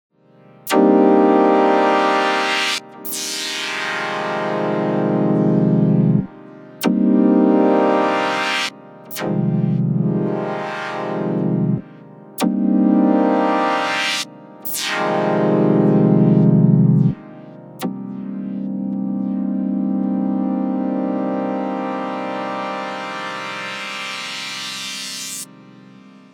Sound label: Sh-4d Seqsky Pluck